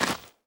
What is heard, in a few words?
tap in; sfx; easterEgg; arborean